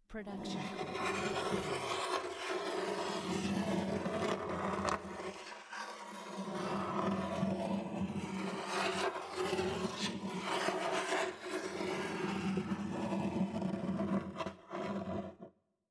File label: horror scrape